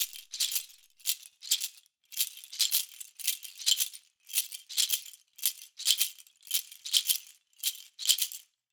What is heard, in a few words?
rattles loop, 110